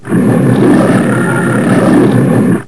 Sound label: roar